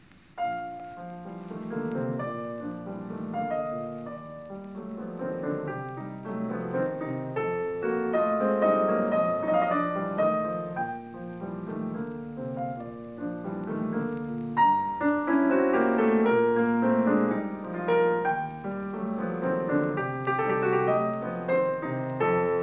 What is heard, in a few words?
Prelude in B-flat major; Frédéric Chopin